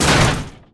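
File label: shot into tin can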